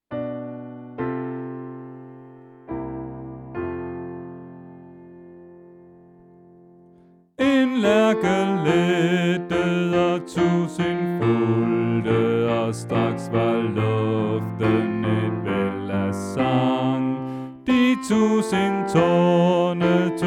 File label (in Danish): Bas